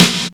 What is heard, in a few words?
sharp snare f key, Royality free steel snare drum sound tuned to the F note. Loudest frequency: 2862Hz; snare-drum-samples